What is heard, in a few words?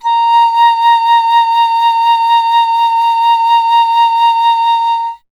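FLUTE PICCOLO A; Miroslav Vitous - Solo Instruments VOL-2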